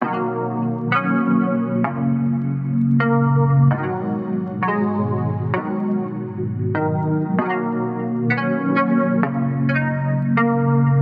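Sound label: 130 guitar levels D minor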